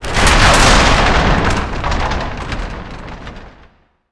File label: Rattle